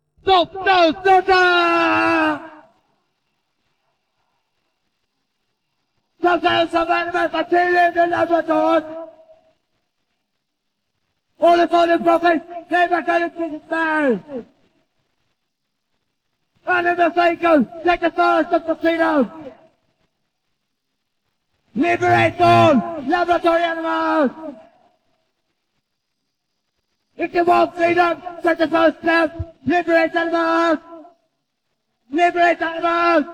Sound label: vocals